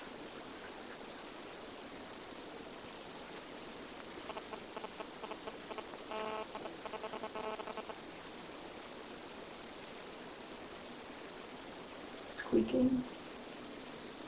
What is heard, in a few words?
digital noise